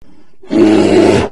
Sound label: bear attack; animalsvotive; Minecraft; Votive Content